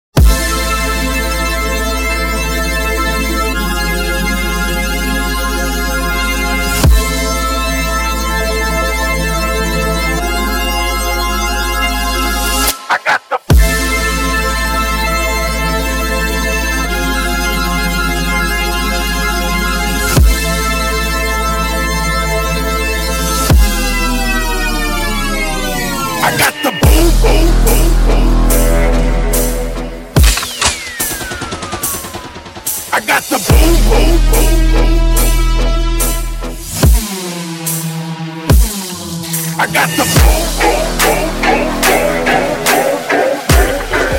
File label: dubstep, Session Time For RIDDIM Party; dubstep24h